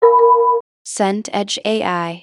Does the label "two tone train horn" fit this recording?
No